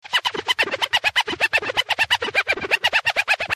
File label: scratch